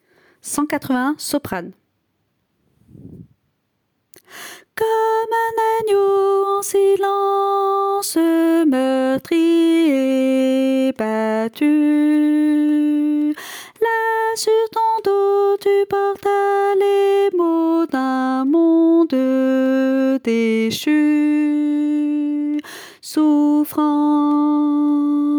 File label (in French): soprano